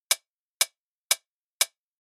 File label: hat from crash